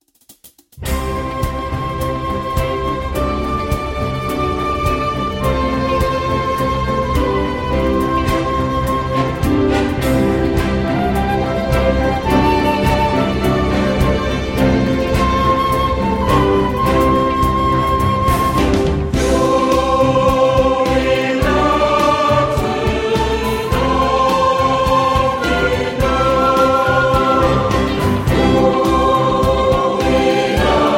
Jubilate Domino Reprise mit Orchester, Chor

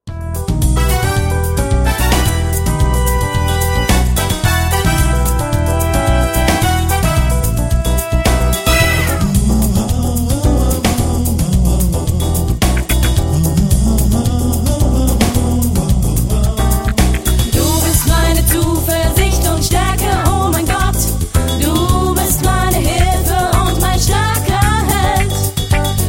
Samba

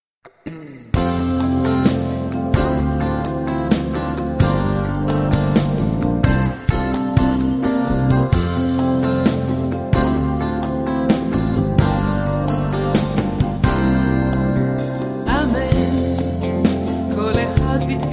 Vocals, Studiochor

